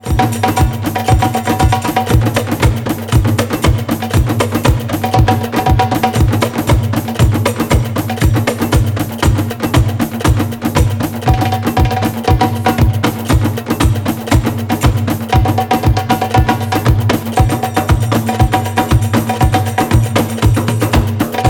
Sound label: Drum sample